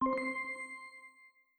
puzzle success

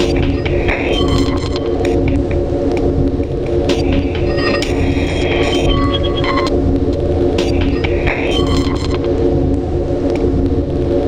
beat d

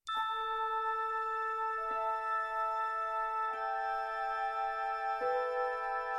opening chime; star-trek

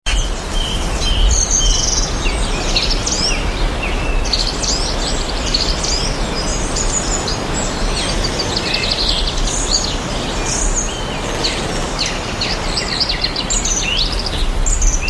forest sounds

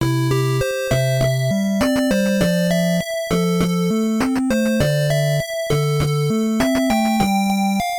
ending; ducktales

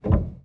piano

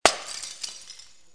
broken glass

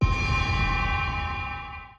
alert

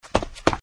run

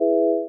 resolve, retro synth; agentmag

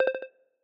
Ping

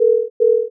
Ringtone

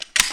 LMG close cover

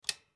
close

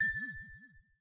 ding

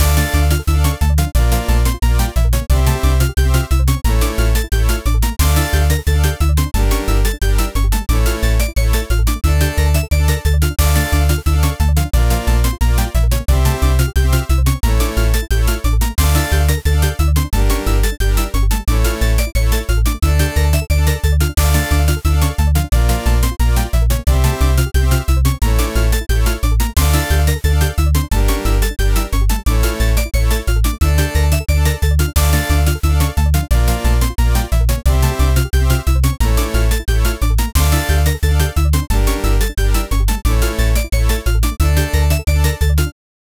battle

